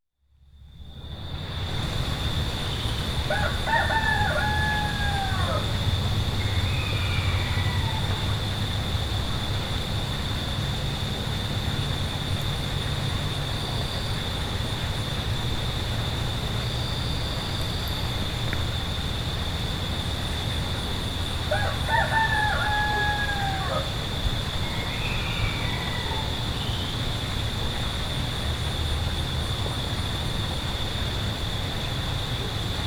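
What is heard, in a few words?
morning village dawn; utrennyaya selskaya zorka; zvuki prirodi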